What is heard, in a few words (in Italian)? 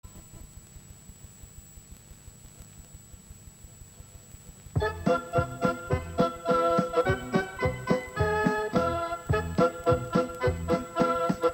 I pirati ballano strumentale